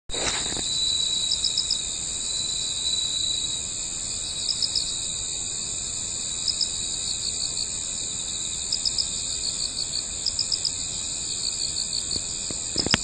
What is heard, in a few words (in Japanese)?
musi no nakigoe